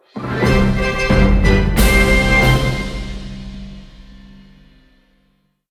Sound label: Big Orchestral Win Fanfare